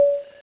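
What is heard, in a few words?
rail cannon charge, weapons; railcanon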